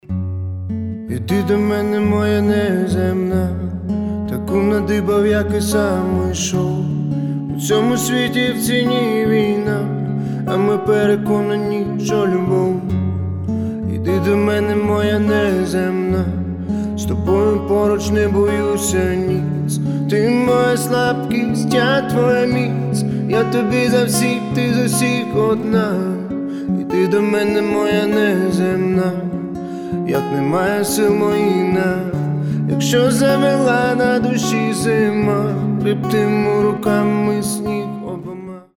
live acoustic

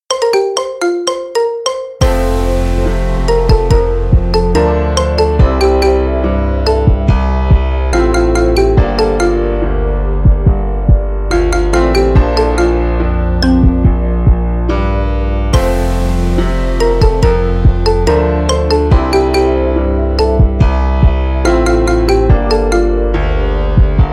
marimba remix

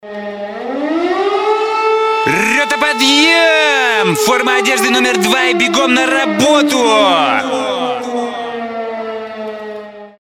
rota podieem sirena

